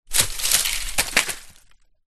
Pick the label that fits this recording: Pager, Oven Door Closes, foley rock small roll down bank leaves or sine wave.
foley rock small roll down bank leaves